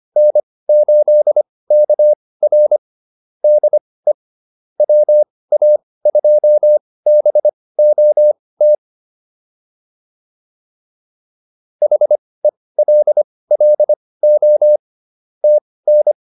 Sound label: QSO, intermediate practice files